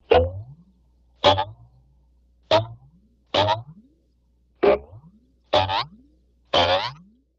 Series Boing Like R, boings

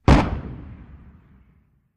175mm Howitzer Fires

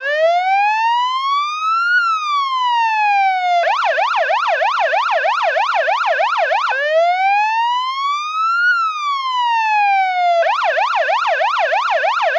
police siren

